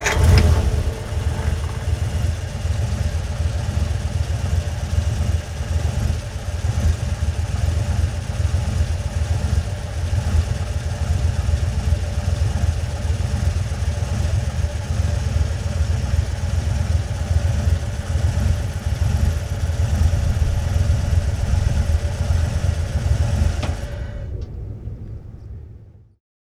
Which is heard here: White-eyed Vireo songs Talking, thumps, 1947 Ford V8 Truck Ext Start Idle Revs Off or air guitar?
1947 Ford V8 Truck Ext Start Idle Revs Off